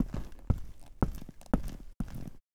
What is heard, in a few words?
concrete walk dry